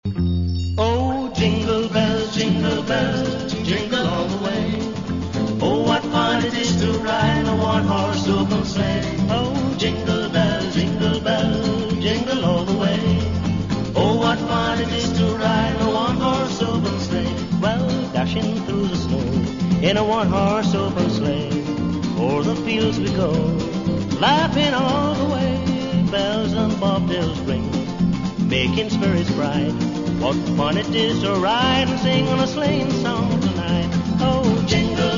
Jingle Bells Medley

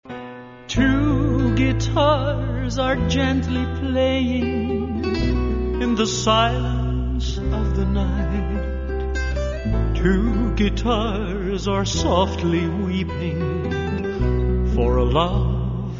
Two Guitars

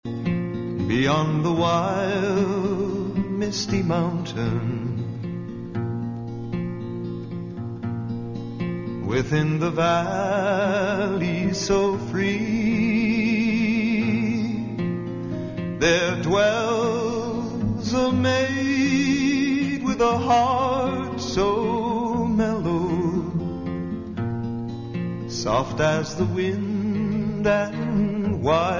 A Minor Ballad

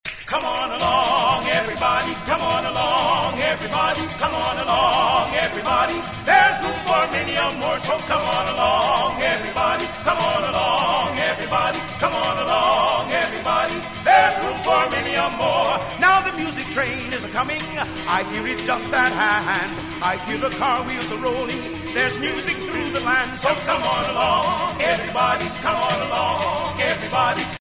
Music Train

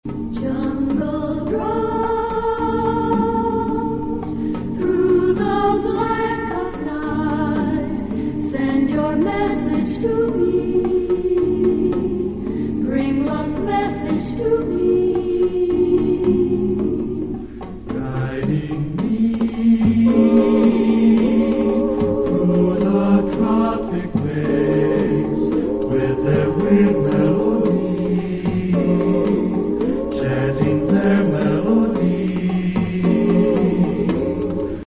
Jungle Drums

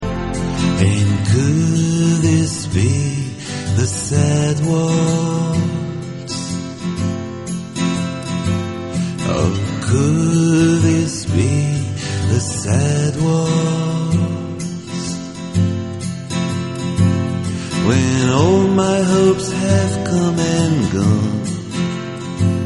Sad Waltz

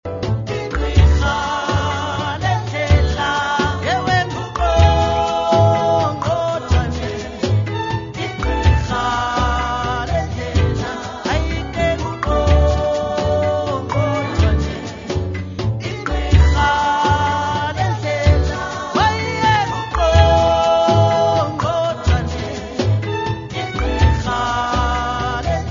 Click Song

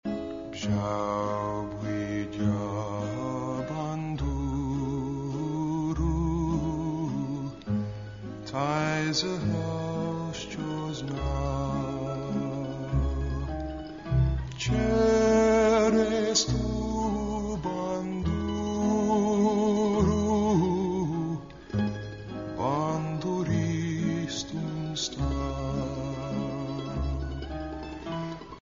My Guitar

